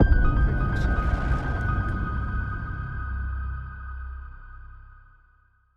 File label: Mystery Ident; soundscrate